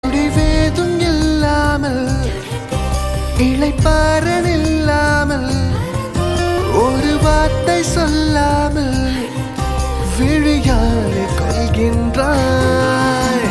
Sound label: Humming Ringtone